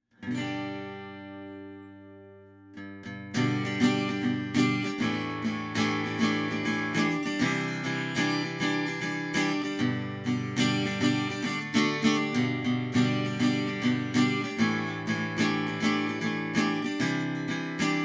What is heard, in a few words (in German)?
a guit at2035 12te bund